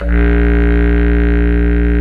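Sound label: bass clarinet, Orchestral Winds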